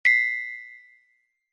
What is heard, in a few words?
item get event